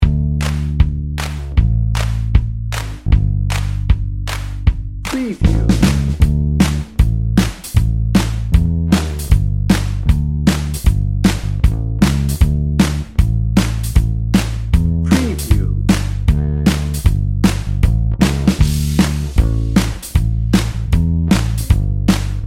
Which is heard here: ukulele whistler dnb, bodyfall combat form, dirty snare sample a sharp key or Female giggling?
ukulele whistler dnb